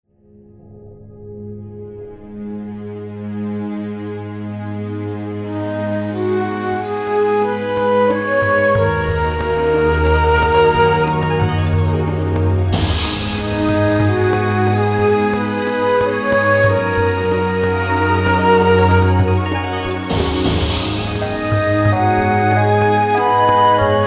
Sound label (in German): chinesisch